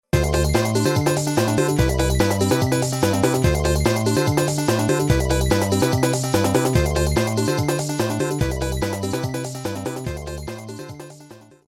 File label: Mushroom Power-Up